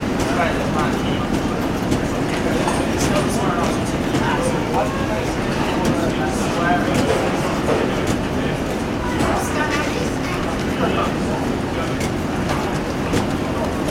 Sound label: ambience airport baggage reclaim; jfk; sound effects one